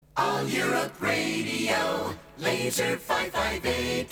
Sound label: jingle; Laser 558; All Europe Radio